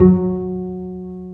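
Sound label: String, contrabass pizzicato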